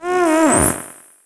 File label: stingray hit; DAoC